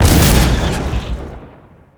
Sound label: Explo Metal Cannon Destroyed; Destruction